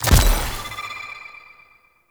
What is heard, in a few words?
Object Drop; Warframe; Warframes; Harlequin